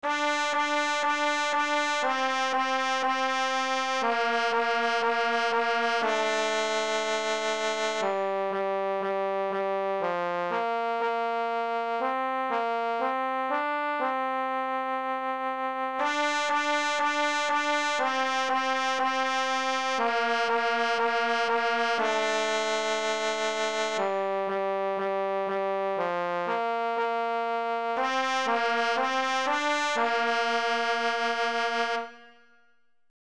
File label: Jolly Old St Nicholas for Trumpet and Trombone